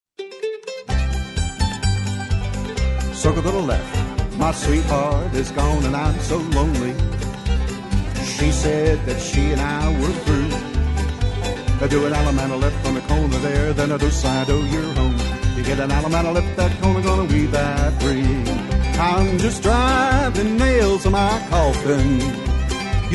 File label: vocal, square dance